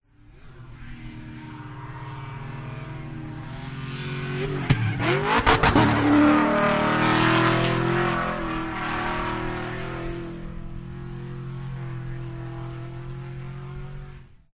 drift car downshifting into a turn changing gear and passes by; with a roar; Sound Ex Machina